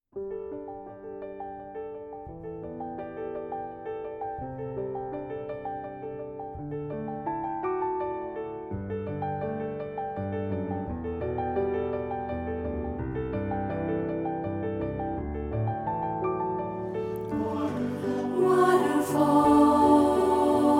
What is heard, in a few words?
SATB (Alto Dominant)